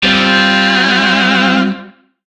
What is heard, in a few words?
Shawzin Pent Min Chord G; Music; DE; Warframe; Lizzie Shawzin